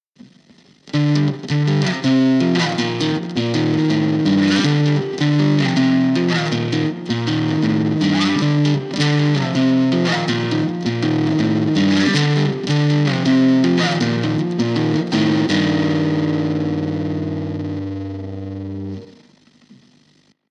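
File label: Gm 160 guitar riff trash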